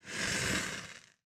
spell fire; RPG

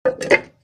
pot

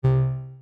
Button Deny, menu

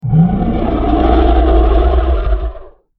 Monster Growl; horror sfx vol 1

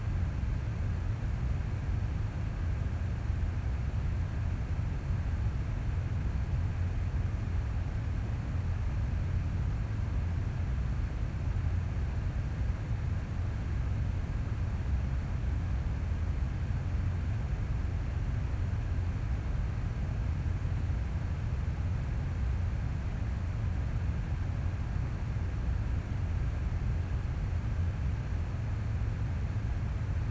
Dehumidifier; Fans and Drones

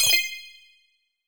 confirm, tones; inteface sfx pack 1

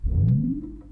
Woom, chairmat; Micro Pack

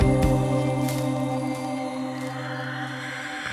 Breath Riser; BB 2HTC Samples Vol 4

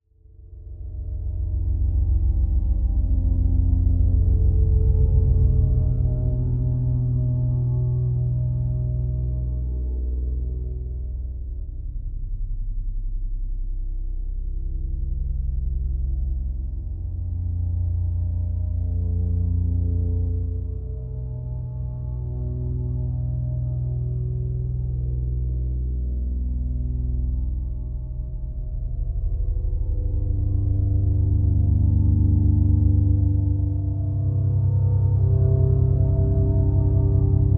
Less Dark Ambient, horror; Loopable; Horror Sound Pack

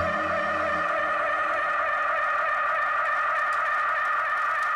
Infinite Delay, Pads; BB 2HTC Samples Vol 4

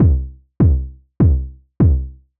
Kick x4; Sample Growing Stream 002

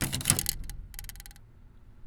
Boyioioing; BB Retail Therapy Sample Pack

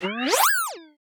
weird, sfx

